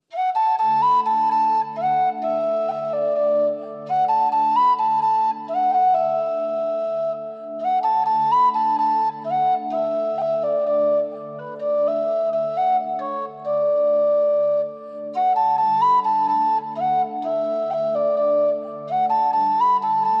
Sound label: Tin Whistle